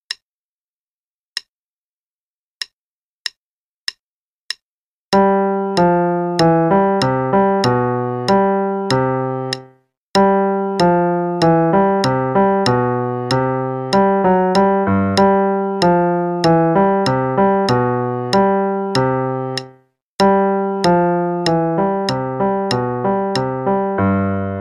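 Play-a-long, Sonatina in C Major, left hand, quarter note=96; Latour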